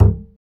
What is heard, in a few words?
acoustic bass fx; Spectrasonics; Bass Legends